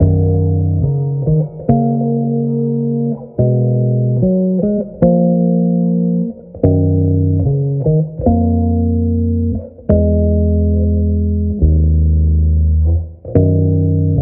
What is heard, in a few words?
guitar, Jazzmatazz Loops